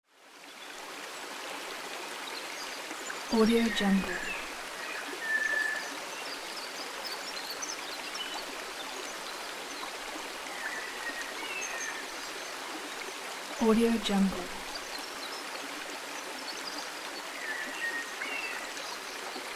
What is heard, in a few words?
stream in forest